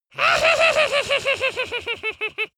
dwarf laugh sneaky

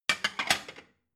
plate shelve slide

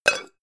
pan close chink short

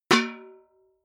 metal bucket hit ring hard

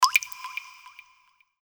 water drop with reverb

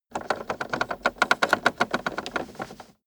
car temperature knob turn long fast

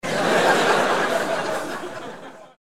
laugh

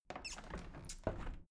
window plastic squeak open soft sequence

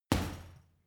boxing punching bag uppercut hard

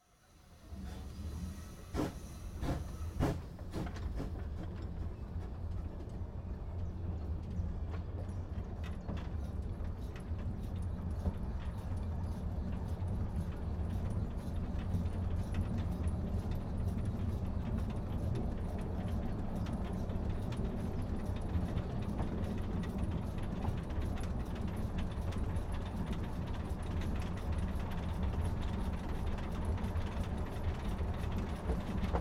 old train cabin inside

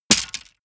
wood sticks small break

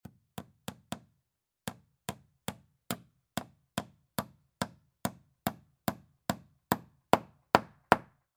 hammering a nail

